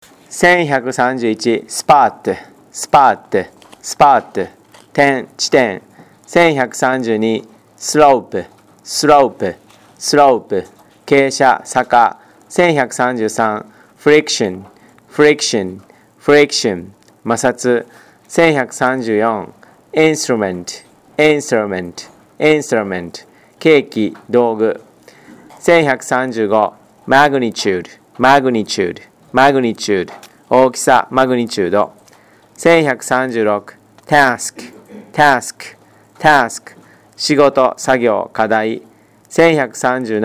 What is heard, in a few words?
tango